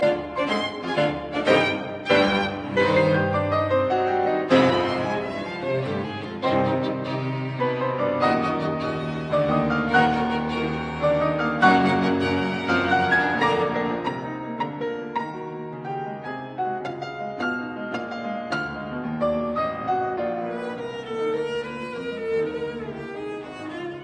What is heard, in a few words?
Piano Trio in C minor Allegro energico; Op 101; Mirecourt Trio